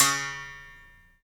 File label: STR BS BER, Berimbau; Roland LCDP11 Africa VOL-1